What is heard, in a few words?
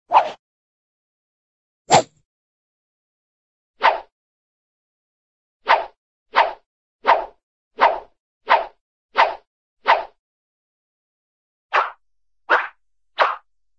swish, cartoon sharp swish